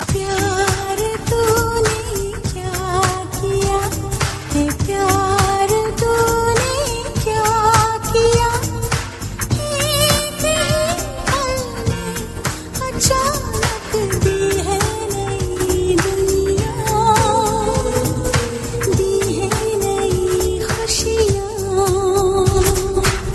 Pyar Tune

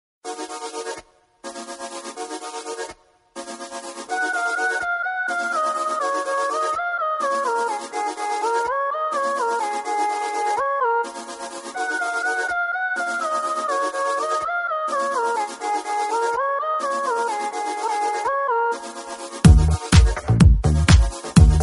Classical Love

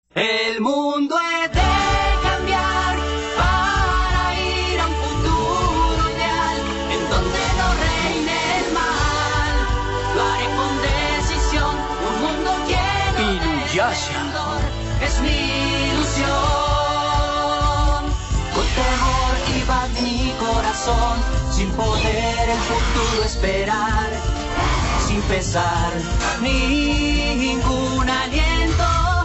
Inuyasha latino

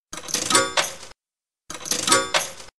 Ching Ching

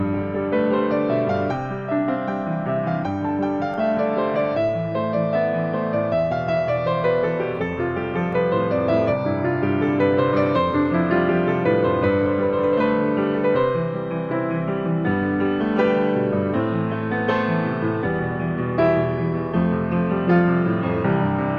Canon In D

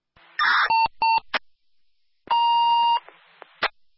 double beep; Hatzolah

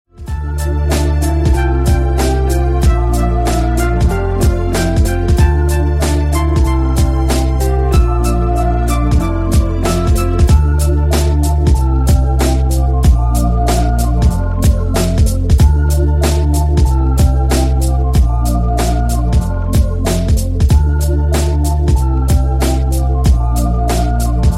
Smart Phone

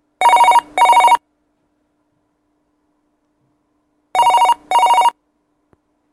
Office Phone

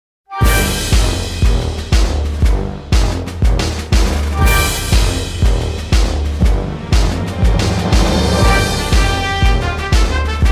Dynasty March

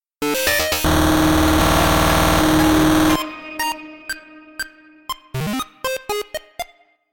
Shooting

